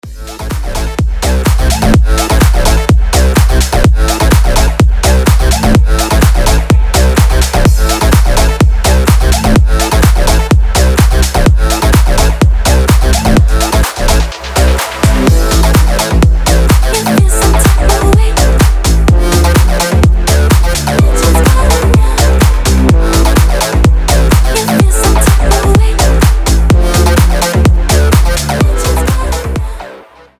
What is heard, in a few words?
phatt bass